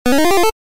呪文を唱える レトロ

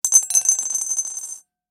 チンチロ サイコロを振る; ボードゲームやカジノ、麻雀

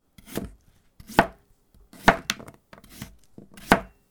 野菜を切る音・きゅうり・その1; 日常生活の効果音

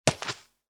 スリッパ 一歩; 足音の効果音

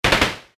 アサルトライフル 3発; 武器効果音

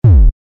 撃つ レトロ; モノラル

